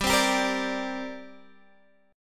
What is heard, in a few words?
C 7th Suspended 2nd